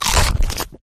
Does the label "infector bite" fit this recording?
Yes